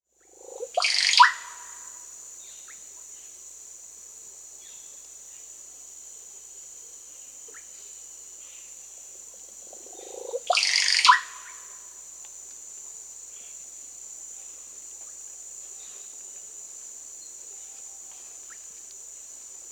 Russet-backed Oropendola (Psarocolius angustifrons), Peru, Birds of the Amazon; S. America